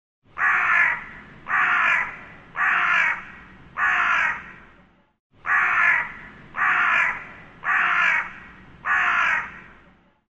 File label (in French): Cri du corbeau le croassement